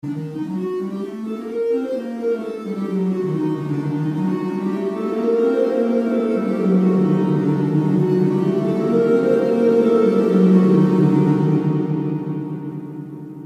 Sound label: vco; EFFECT 3: PLATE